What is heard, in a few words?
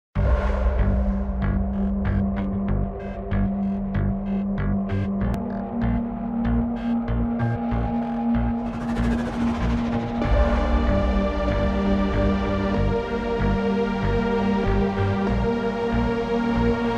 Checkpoint; metal slug awakening code j soundtrack; android